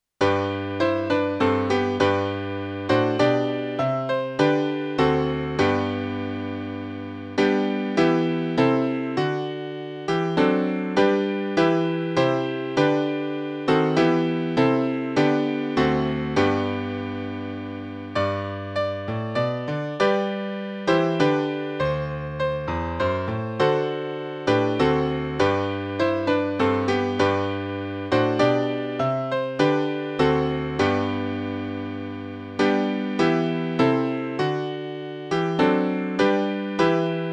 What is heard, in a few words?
midi